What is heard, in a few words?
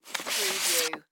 Envelope Letter Remove; Sound Effects Bible